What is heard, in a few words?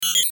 button digital bleeps; Sound Design; Multimedia